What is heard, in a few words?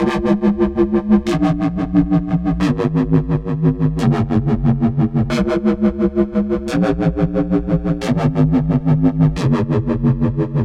Huming Bass, Drum n Bass; KJ Sawka; Drum n Bass & Beyond